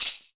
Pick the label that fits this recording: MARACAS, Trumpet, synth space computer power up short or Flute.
MARACAS